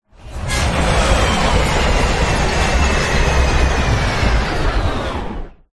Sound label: Sci-Fi Power Down; Ryan Van Eerde